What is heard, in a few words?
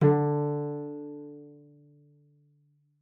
cello pizz D3; 90 s Sample CDs; Xsample VOL-5